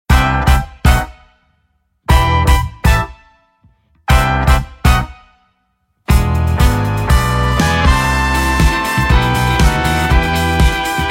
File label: Karaoke Version; Eurovision 2022 - Slovenia